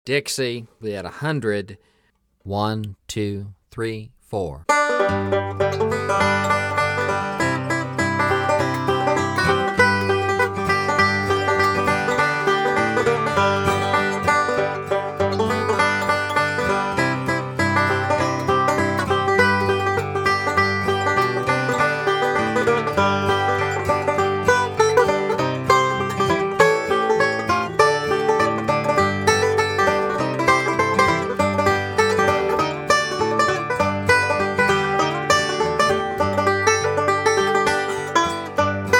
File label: Break 100 Bpm; Dixie